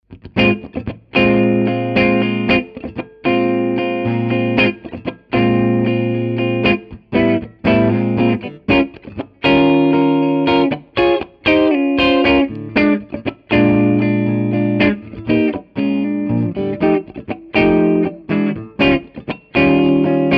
clean neck bridge; schwarzbach; HB59-CreamGen

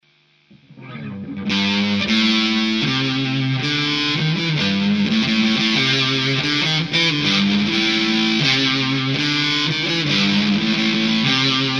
dist, humbucker; Neck 1

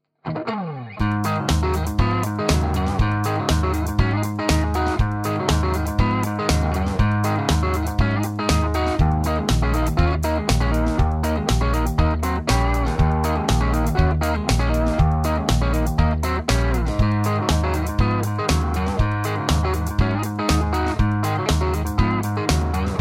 crunch neck, Tele; schwarzbach; VP-Tele-Pre55

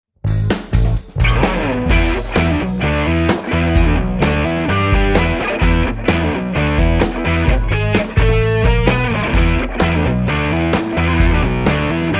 highgain neck; schwarzbach; HB59-CreamGen

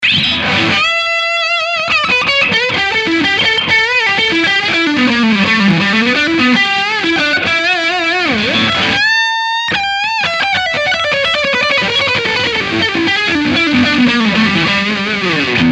brett middle, Strat; schwarzbach; VP-Strat-66

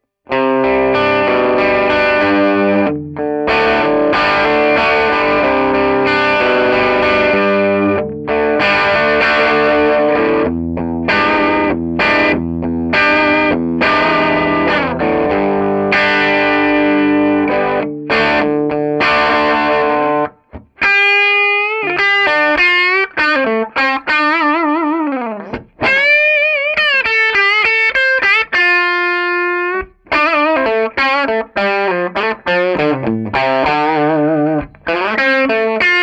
crunch bridge; schwarzbach; HB59-GrowlDogs

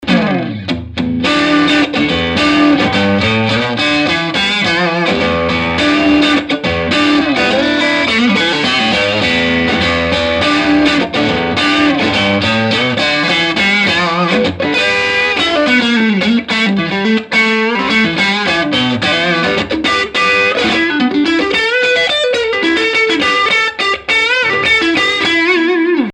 crunch neck, Strat; schwarzbach; VP-Strat-66

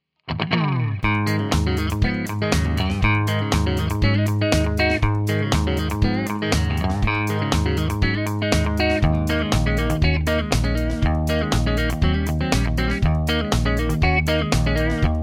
clean bridge neck, Tele; schwarzbach; Tele60s

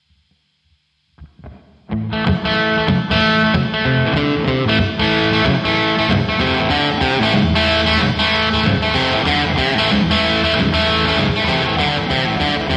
humbucker Fender Boogie; Overdrive; Bridge 2; HardGen

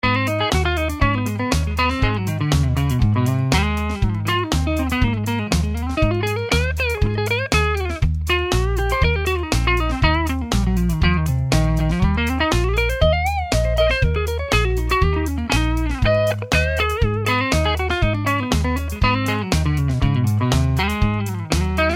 clean neck, Tele; schwarzbach; VP-Tele-57

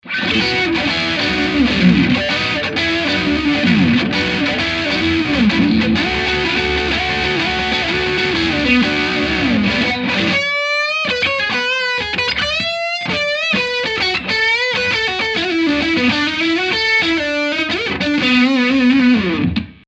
brett middle bridge, Strat; schwarzbach; VP-Strat-56

